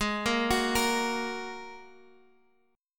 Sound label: G sharp 7th Suspended 2nd G sharp, down, Guitar, Drop D